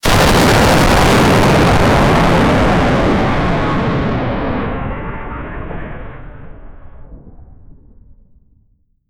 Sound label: a bomb is thrown from